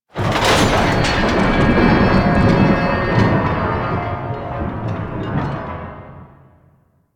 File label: Gate Door Close Start; Eidolon Ostron; Warframe; Ambience; Eidolon Plains